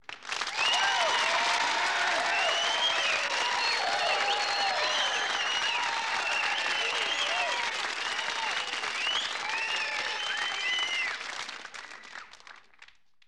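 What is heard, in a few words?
Crowd 100 Interior Canned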